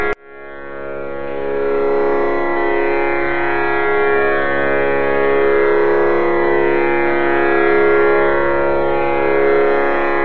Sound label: shrutibox